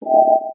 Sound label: ufo tone; Scars FNC SFX